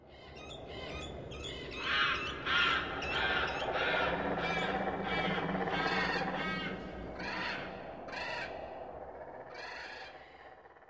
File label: Crow Echo; ambience